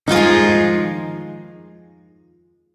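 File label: Shawzin Minor Chord; F; Warframe; Duviri Shawzin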